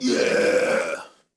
Crowd Cheering; Pitch Black Audio Pong

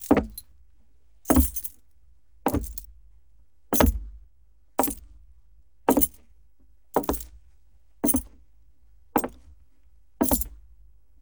pacing keys; mayor; DeadFright

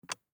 DICE on Glass, Grab, Standard, Two Dice; Samples; ArticulatedSounds; DICE Sfx Party (Extended)

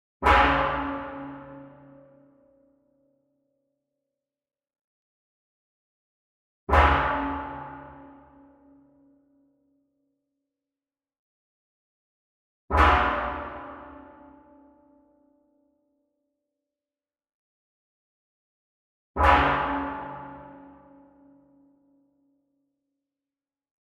Metal Pan Flam Far; SWEETENER; boom library; Cinematic Strikes Construction Kit

